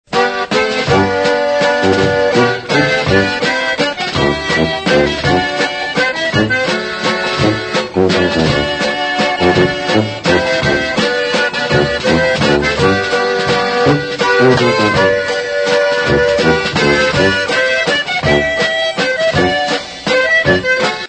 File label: waltz